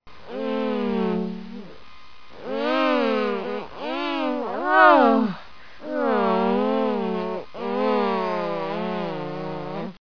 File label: minor moan